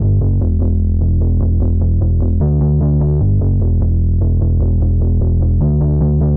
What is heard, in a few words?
Rock Metal